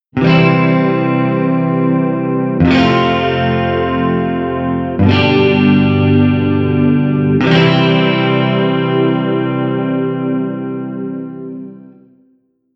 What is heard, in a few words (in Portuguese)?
Cadencia C F G C, guitar